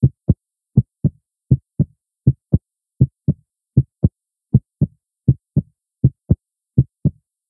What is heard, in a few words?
heartbeat